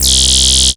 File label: CREEPY C1, sequencer; Best Service Alpha Dance vol.2